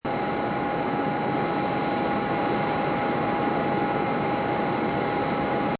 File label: a319 plane ext